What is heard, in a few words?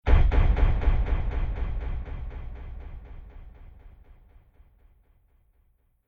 Metal Impact Metallic Concussion Echoes; Horror Scifi Science Fiction; Hit